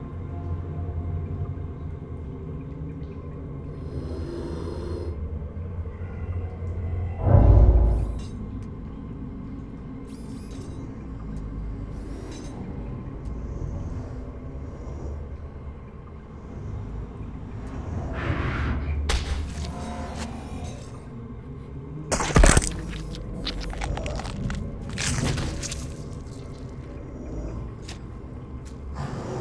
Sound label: bathroom; DoomRTX